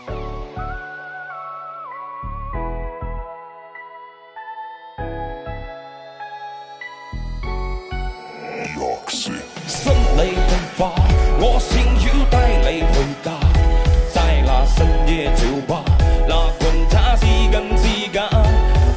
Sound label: song vocal